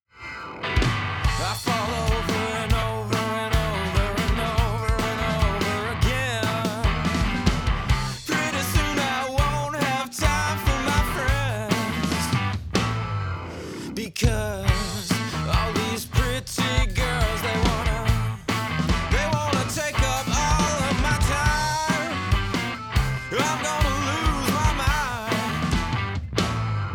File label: master without